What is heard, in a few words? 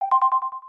Check Off; aronwk collection; ndaudio